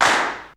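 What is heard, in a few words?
XE8 clap; Hollywood Edge; The Big Bad Beatmachine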